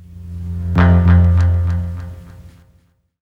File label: GTR, Hip Hop R n B; USB Soundscan vol.19; AKAI